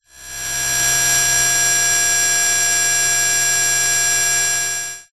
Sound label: sound design harsh tone